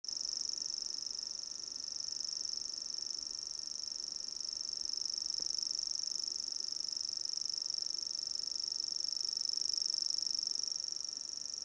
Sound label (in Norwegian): gresshoppesanger Fammestad, natur